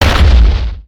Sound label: terrain blast; creatures organic